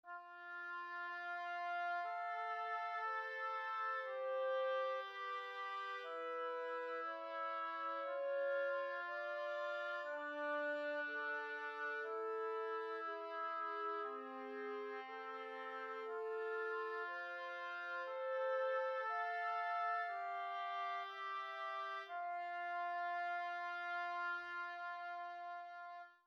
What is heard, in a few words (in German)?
Zweistimmig Vierte Gattung